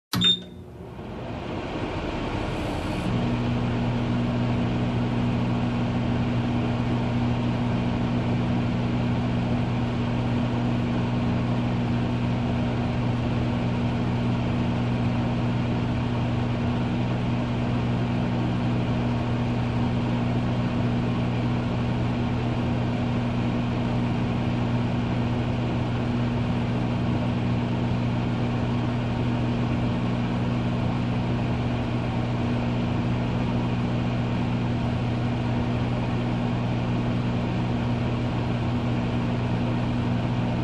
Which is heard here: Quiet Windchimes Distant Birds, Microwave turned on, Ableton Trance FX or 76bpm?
Microwave turned on